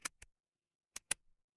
ui click

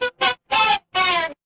feedback level up

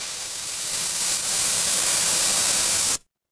fire electric fuse

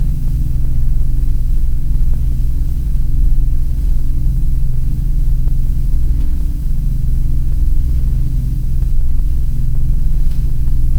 ambience room tone

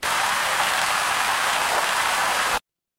water stream

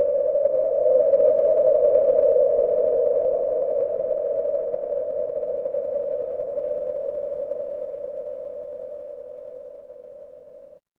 ambience wind

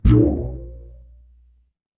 cartoon boing

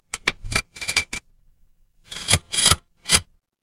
mechanical lever

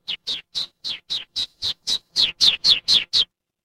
animal bird

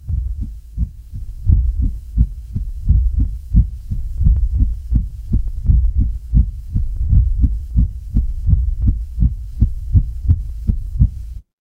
horror heartbeat

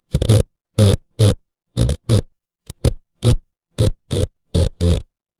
fire electric arc

